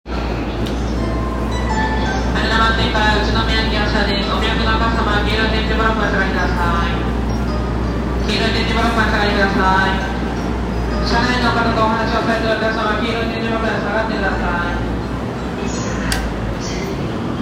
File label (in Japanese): JR宇都宮線東京北, train sounds